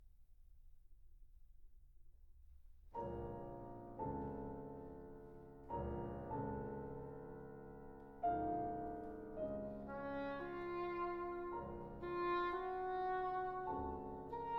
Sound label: sombre with dignity sostenuto, orchestra